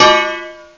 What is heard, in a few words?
glass hit